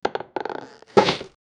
wooden box creaking when; mobile game

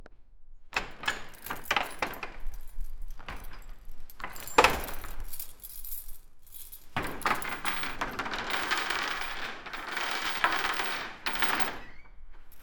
wooden door with chains

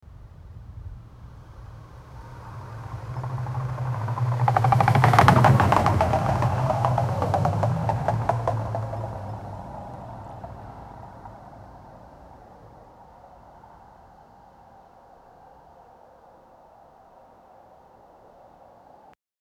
wagon with wooden wheels, asphalt

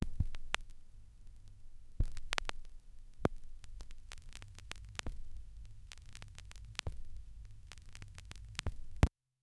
vinyl pulling the needle

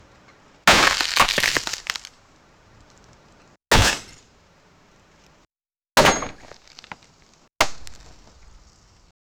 sudden loud and explosive, log

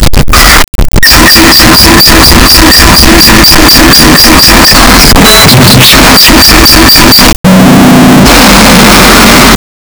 boot sound, system

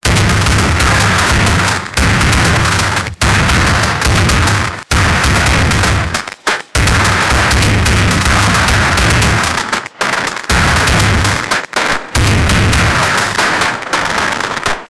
a gangster music type sound

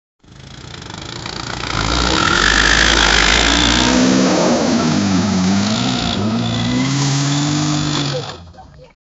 Bajaj scooter

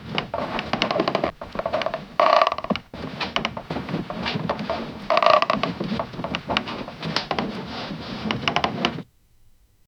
boards creak slowly rhyt; dragging

